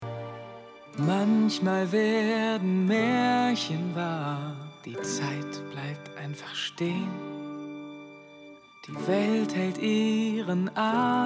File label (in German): gesang